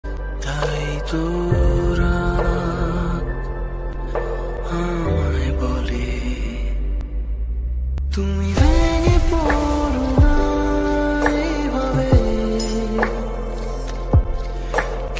Bengali Ringtone

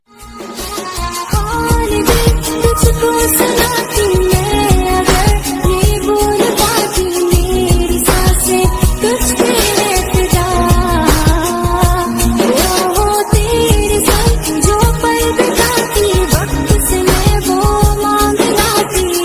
Hindi Love Female Ringtone

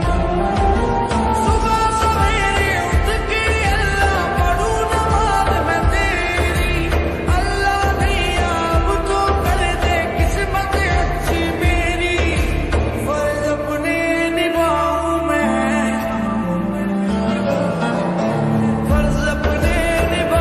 Naat Ringtone

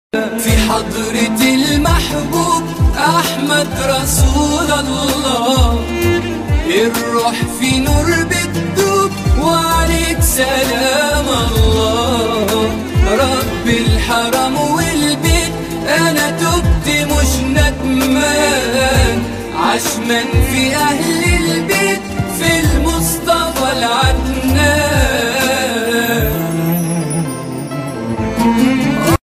Islamic Arabic Ringtone; ringtones

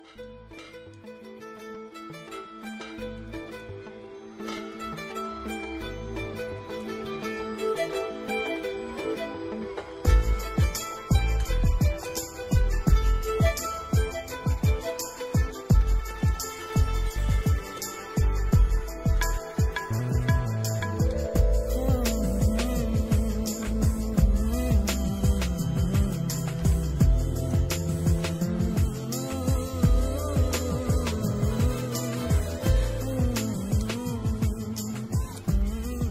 Ringtone Instrumental; Ghajini

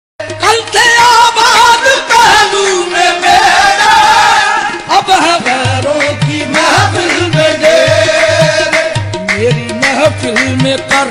Heart Touching Ringtone

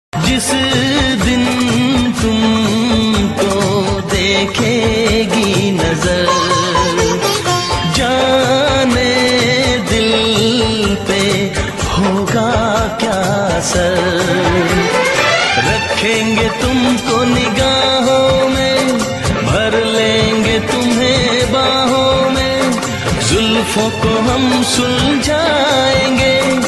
Old Hindi Ringtone

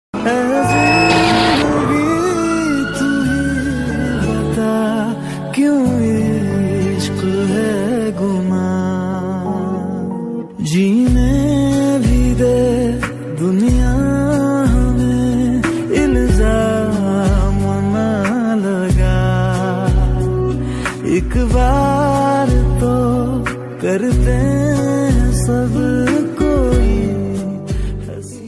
Sad Hindi Song Ringtone; ringtones

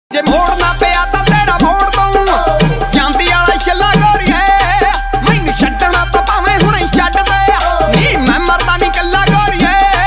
Punjabi Ringtone

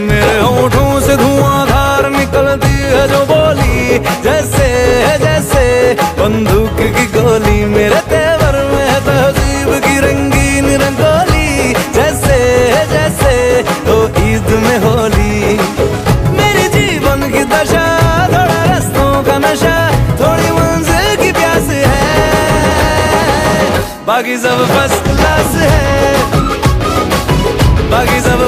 Bollywood Song Ringtone; Kalank Movie

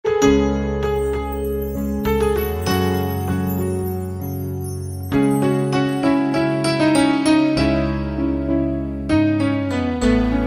Romantic Music Ringtone for Mobile

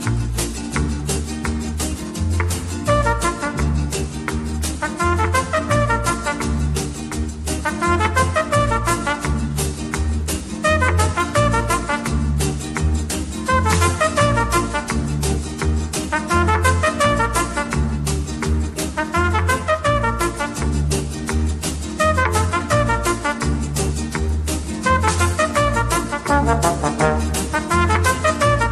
Song Ringtone

